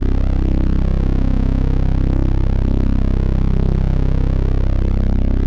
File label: 505, String Machines; Best Service; XX Large Most Wanted 2; PARA-505